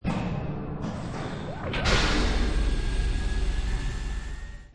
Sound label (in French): porte; rollercoaster